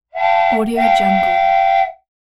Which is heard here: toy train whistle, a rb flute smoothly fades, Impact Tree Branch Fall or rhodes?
toy train whistle